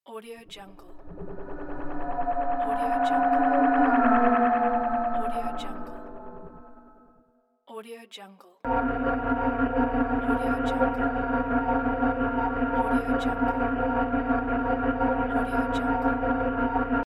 ufo

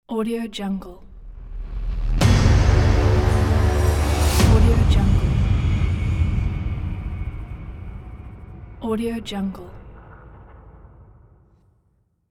dark cinematic logo

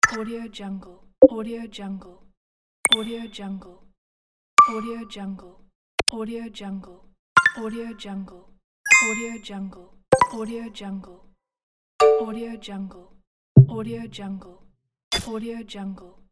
button pack; gamechestaudio

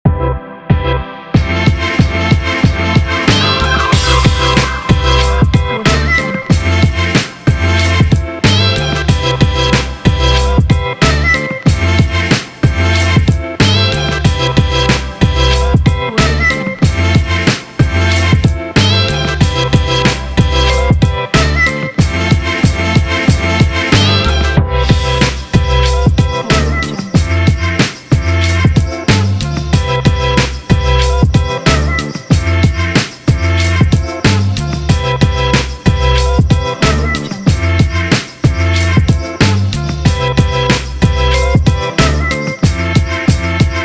on chill

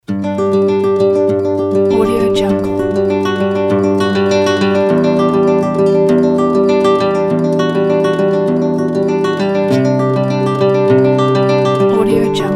inspiring acoustic guitar

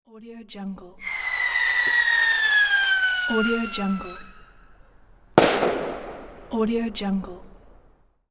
fireworks

